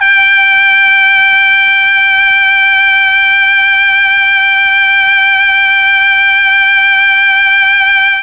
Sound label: G3 trumpet, Mellotron; Mike Pinder - Mellotron Archive CD; CHMB TRUMPET